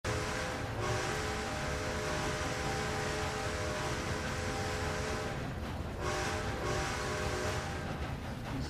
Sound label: train whistle